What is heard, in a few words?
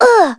Vox Damage; Maria; kingsraid; voices heroes en